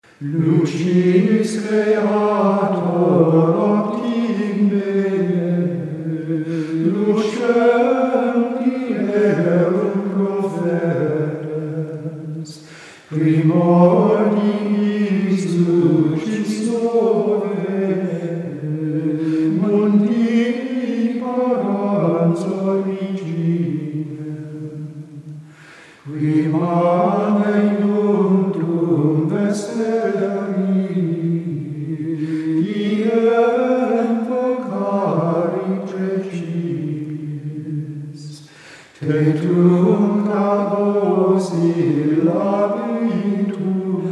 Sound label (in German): Vesper – Gesang, Gregorianische Gesänge